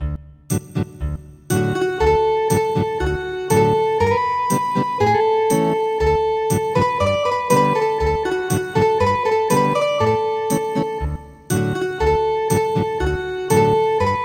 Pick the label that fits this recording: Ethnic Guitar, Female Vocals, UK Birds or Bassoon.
Ethnic Guitar